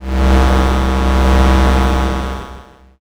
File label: space engine